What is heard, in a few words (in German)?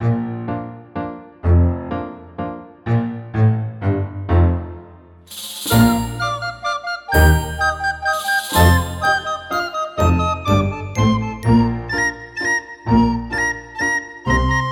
Walzerlaune; Die Diggedies in Walzerlaune